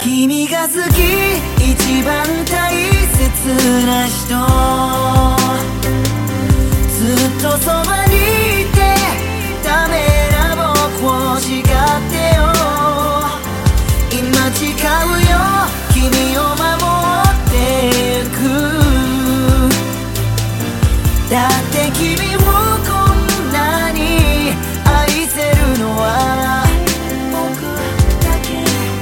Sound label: japanese song